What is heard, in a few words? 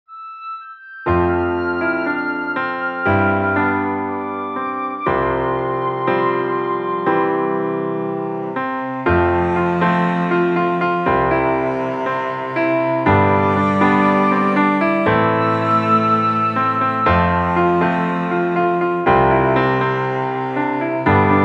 God Is My Salvation Full Instrumental